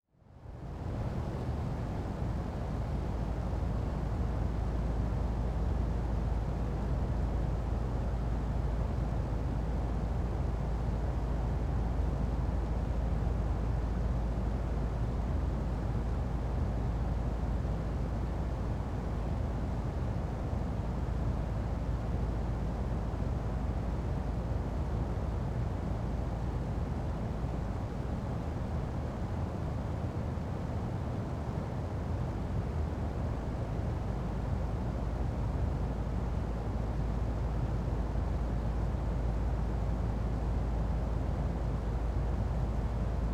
Ambience Platform Between Two Fans; AdobeCC

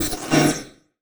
Multimedia Internet CD-Rom Flash Blast

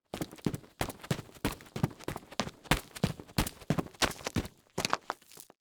Foley Footstep Hard Sole Run On Dirt; Foley Footsteps

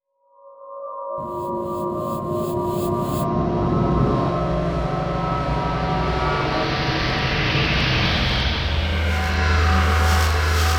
Electronic Whoosh Reverse Feedback Digital; Adobe; Production Element; Imaging

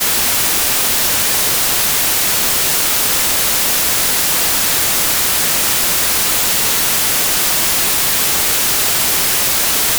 white noise

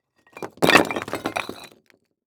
Impact Brick Falling Down

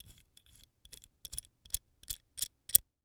Industry Tool Wrench Monkey Wrench Adjustments

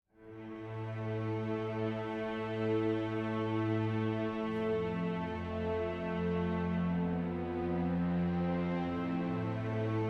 Slow 55BPM; Cinematic Trailer Music

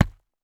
Industry Tool Sledge Hammer Hit On Tree Stump

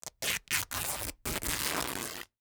Foley Cloth Ripping Long

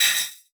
Multimedia Internet CD-Rom Flash Wipe Short

